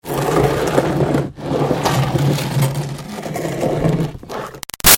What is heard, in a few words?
Green Garbage Pail Scrape Driveway; Plastic; Gritty; Scrapes